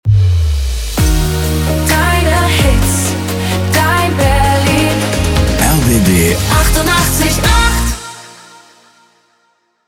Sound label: Transition Up Vox